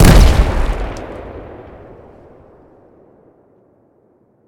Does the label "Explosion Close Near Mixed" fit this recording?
Yes